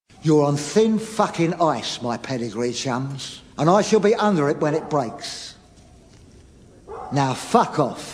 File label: Flute; Snatch